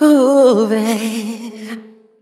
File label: "ooh babe" SUNG; ooh ahh